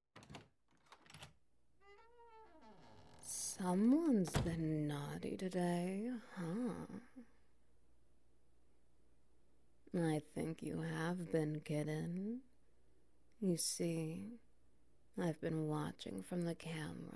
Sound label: Wet Noises; Madame's Knot